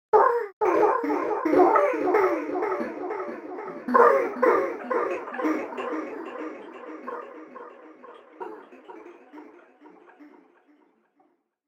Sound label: Creepy Vocal Delay Sound; Devil Voice Sound Effects; horror sound effect